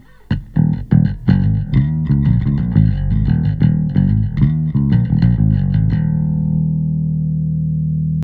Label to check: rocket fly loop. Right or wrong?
Wrong